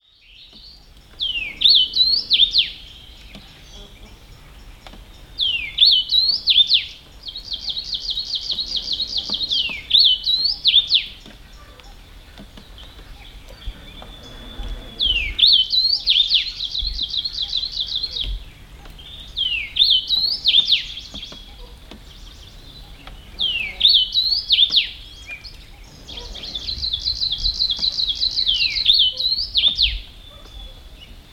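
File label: Kamchatka Leaf Warbler; Christoph Zöckler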